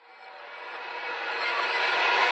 Lo Fi Revese

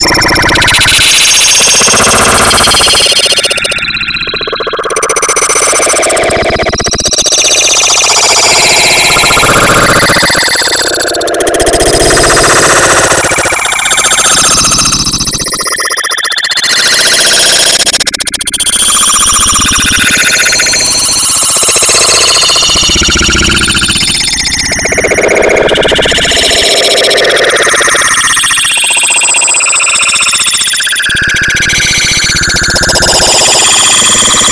Space Noise No Echo

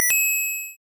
Coin

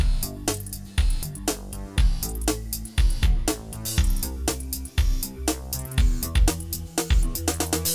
Rock Loop

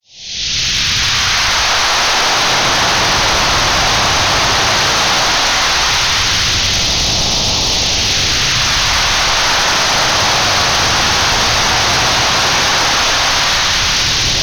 Weird water sprayer

